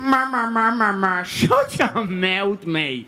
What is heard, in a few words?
murmurmur